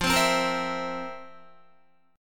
F Major 7th Suspended 4th F, Guitar; strummed

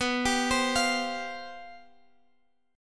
C Major 7th Suspended 4th, guitar

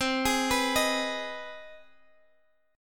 C Minor Major 7th Sharp 5th C

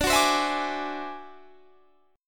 D 7th Sharp 11th D, chord

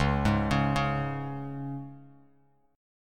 C sharp 5th, Bass Standard; arpeggiated; C#5; JGuitar

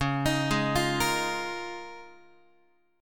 C sharp 6th Add 9th C sharp down Guitar; arpeggiated; C#6add9; JGuitar

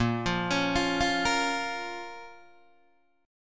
Bb Minor Bb down Guitar Open D

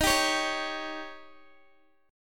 Eb 7th Eb, Guitar DADGAD; x,18,17,18,16,x; down

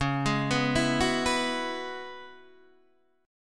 C sharp Minor 11th C sharp, Guitar DADGAD

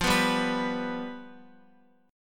F Suspended 2nd Flat 5th, Guitar; strummed; x,x,5,4,7,7; JGuitar

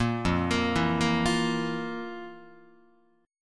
F Major 7th Suspended 4th F, Guitar; arpeggiated

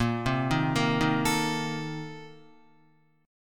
Bb Major 7th Suspended 2nd Suspended 4th, Guitar; BbM7sus2sus4 arpeggiated; JGuitar

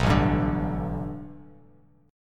A 7th Suspended 2nd Suspended 4th Bass Standard; A7sus2sus4 strummed

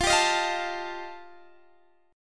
G 7th Suspended 2nd Sharp 5th F chord

